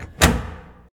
lights heavy on; Ed Edd n Eddy; Adromulus Pack

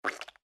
Fart

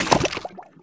Squirt; Ed Edd n Eddy; Adromulus Pack

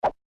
Throw

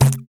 Grab; Ed Edd n Eddy; Adromulus Pack; EEnE

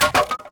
Wood; Ed Edd n Eddy; Adromulus Pack; EEnE

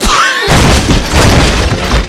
collapse hard crash

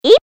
yip

